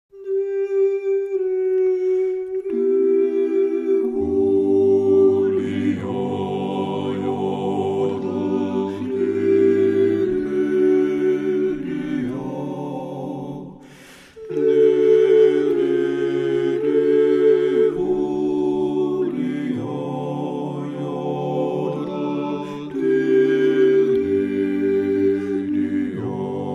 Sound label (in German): Allerseelen Jodler